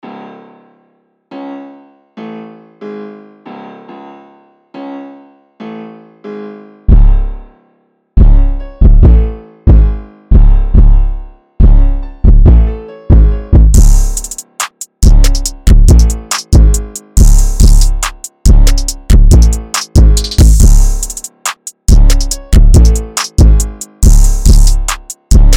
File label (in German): sehr hard piano 140 bpm, beats